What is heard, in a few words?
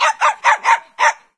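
rural random dog small; random amb sfx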